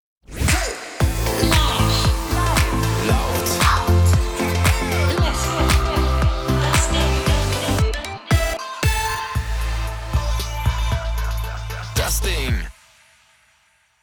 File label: 3D Jingle; DAS DING